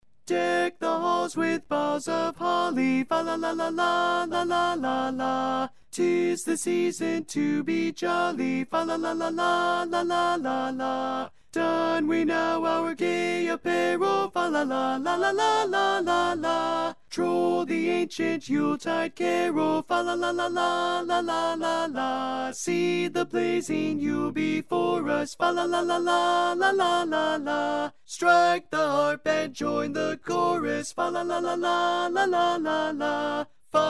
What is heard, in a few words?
Deck The Halls Tenor, Grand Chorus